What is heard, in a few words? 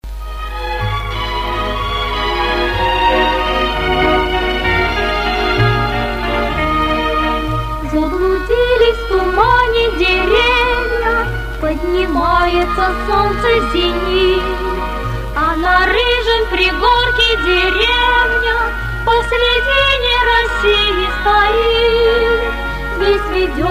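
detskiy hor, children's choir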